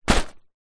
foley tent ground sheet impact hit hand

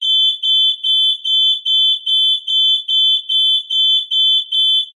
Fire Alarm

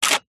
technology camera dslr shutter close; zapsplat

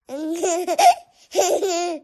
vox 12 month baby cute laugh giggle

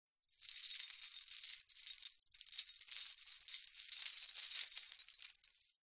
industrial chain small movements jingle

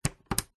technology computer usb a cable plug in; zapsplat

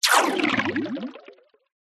multimedia game designed water movement

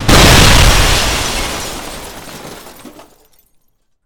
Building Explosion; Blastwave FX

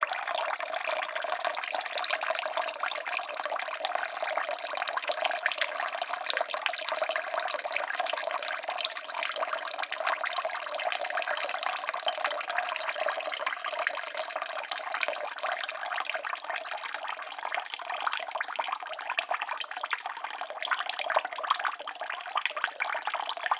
water trickle from tap into water

